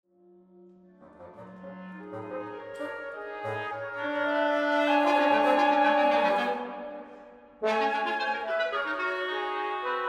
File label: Wind Quintet